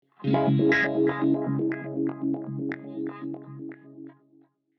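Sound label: Guitar effect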